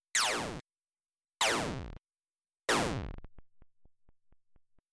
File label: beam LFO